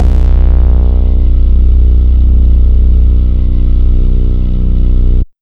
TRANCE, Bass Analog & Synth; TRANSFUSIO; USB Soundscan vol.12; AKAI

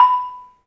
MARM, 3D MARIMBA; 3D Sound; 3D Keys VOL-1

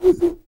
TUV BLOW, World Voices, TUVAN GROAN; Spectrasonics Vocal Planet CD5

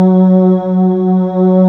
SYN STARRY; Sound & Vision - Gigapack I CD 2; JD 800/990

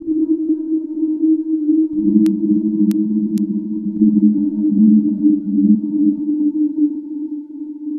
Droon; Sonic Foundry (Sony Creative Software) - Future Retro Dance Excursions

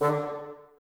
BNS D, Bassoons, Wood Winds; Miroslav Vitous - Woodwind Brass Ensemble; Bassoons Links

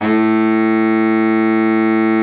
BARITON, SECTION LOOP, Horns; Best Service; Killer Horns

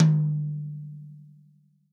TOM, Real Drum Kit, POP KIT

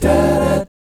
EB13, GUY CHORDS, Vocal, Jazz; ILIO; Vocal Planet VOL-3; Jazz & FX

